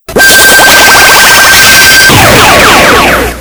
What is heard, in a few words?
death sound